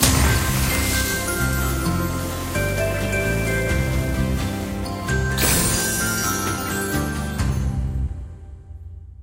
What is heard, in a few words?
bigwin; Great Book Of Magic Deluxe; Wazdan